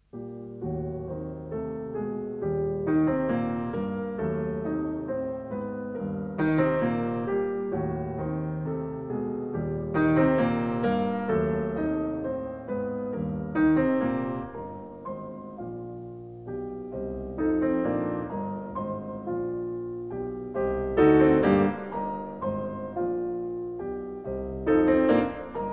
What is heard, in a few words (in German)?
Carnaval für Klavier; Wilhelm Kempff; Op 9; Pierrot